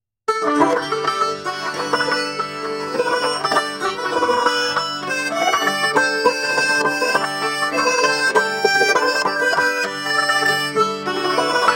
indie folk acoustic guitar banjo harmonica intimate storytelling rustic heartfelt; countryside; AI audio; Stable Audio; Stability AI